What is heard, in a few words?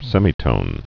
semitone